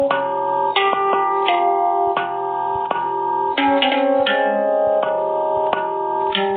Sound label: Hang drum